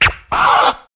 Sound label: FX Dance